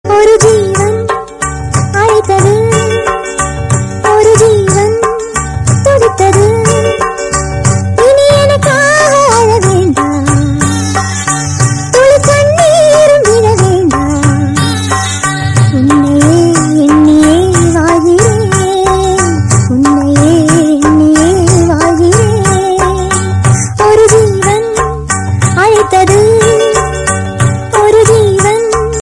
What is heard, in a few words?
tamil old is gold tune